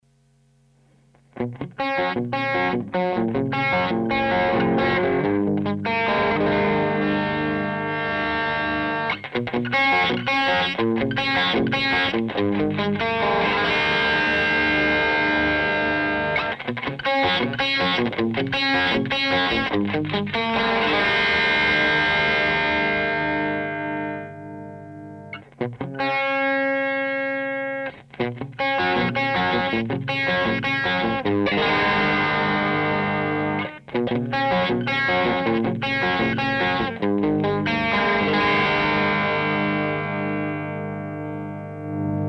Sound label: Strat EQ Test Treb Mid Bass Pres